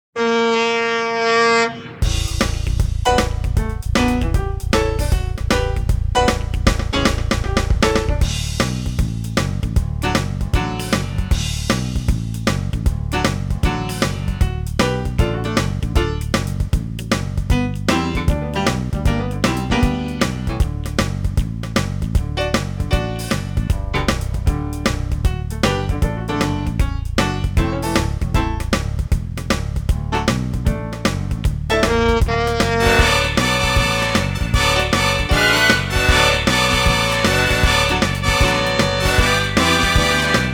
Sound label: Song (Instrumental); Spotlight 2